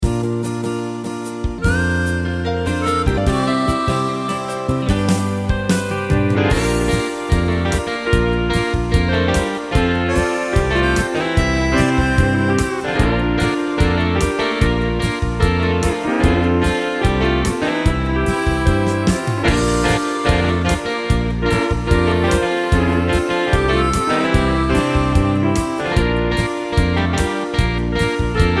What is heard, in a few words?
Country Beat